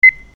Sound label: beep